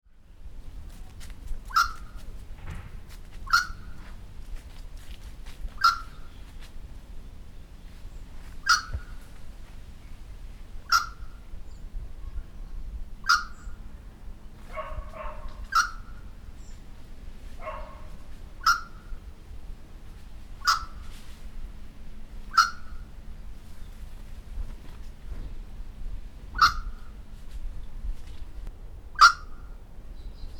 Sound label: wattlebird single call